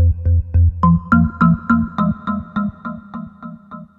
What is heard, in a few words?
SMS Ringtone; Death Note